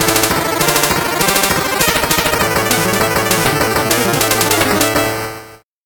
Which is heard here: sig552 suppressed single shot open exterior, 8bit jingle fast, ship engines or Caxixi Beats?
8bit jingle fast